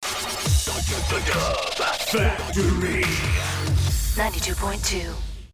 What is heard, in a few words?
Jingle; Dub Factory 92.2